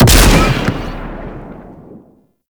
Rifle Shot Alt, Weapons; Warframe; Zariman Grn Wraith Rifle

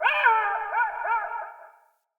Forest Open Glade Animal Far, Day

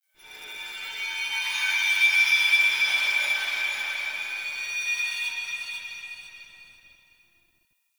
Glass Particles; Warframe; Ambience; Nokko Colony

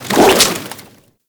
Tentacle Whoosh; Kraken Tentacle Whoosh; Pirate; Warframe

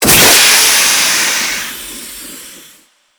Steam Damage Hit; Warframe

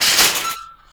Shopping Cart Hit; Warframe; Ambience; Nineteen Ninety Nine

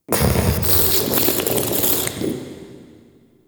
Interior Gurgle Slurps, Infested, Ambience; Misc Indoor

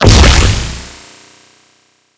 Buff Burst; Syndicates; New Loka

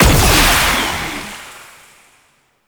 Incarnon Wrist Gun Alt Impact, Weapons; Warframe; Tenno

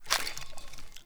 Foley Step; Enemy; Corrupted Sproutfolk

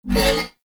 Ram Pain, Animals; Duviri

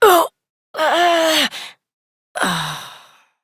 Death, Adult; New War; Operator

